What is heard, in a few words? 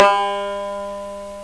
banjo low; Sound Effects for Multimedia - Volume 1